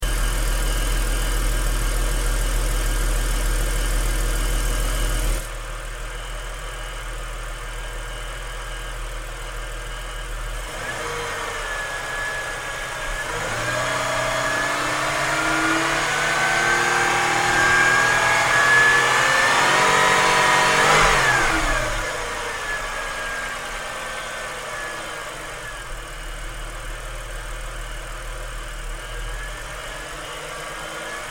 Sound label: engine sound response to throttle